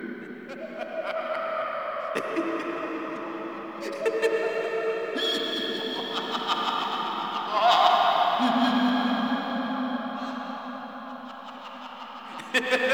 Laughter Loop; Warframe; Shadowgrapher; Interior

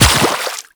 Run Water, Footsteps; Warframe; The New War; Characters; Kahl

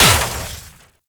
Radio Tower Speaker Damage; Ambience; Gameplay; Infested; Warframe; Nineteen Ninety Nine

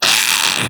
Infested Splitter Split Top Vocal Pain; Warframe; Grey Strain

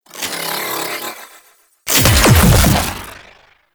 Draw And Fire; Cinematics; Drifter Lotus End; Omicrus

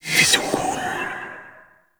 Grimoire Fire Whispers; Warframe; Tenno

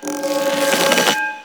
Choir Bow Charge; Warframe

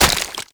Run Sludge, Footsteps; Warframe; Tenno

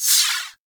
Fighter Rager Fire Whiz By; Grineer; Space Fighter Rager Agent

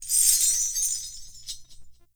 Binaural Chains; Ambience; Warframe; Shadowgrapher

